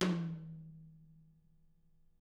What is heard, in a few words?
DW, Drums; DW KIT1; DW 8 HIT